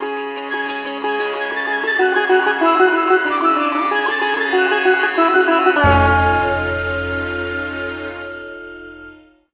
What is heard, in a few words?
Bari Sax; simpsons hit run; ps2